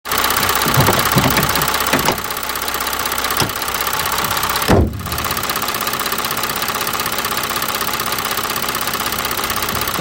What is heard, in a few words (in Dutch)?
1e testrit belast, bijgeluid motor in deellast